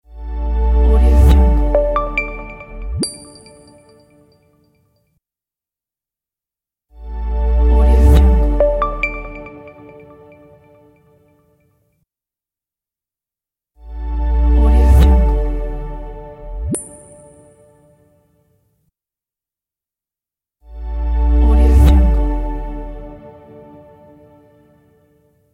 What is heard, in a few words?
opening clean ident